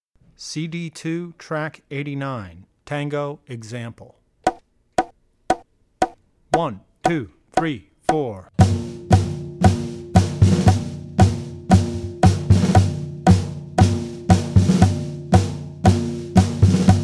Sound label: Wedding Dances - Tango; Drummer's Bible Tracks